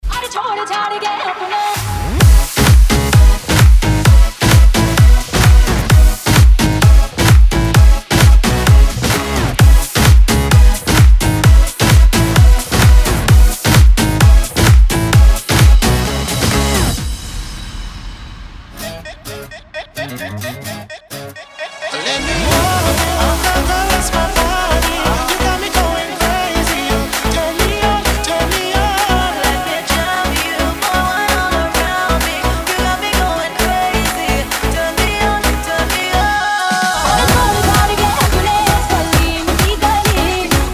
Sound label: ringtone, remix